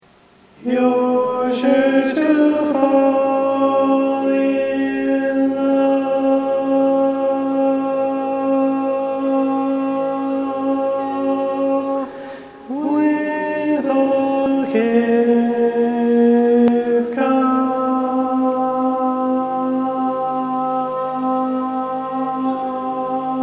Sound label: Lead, barbershop tags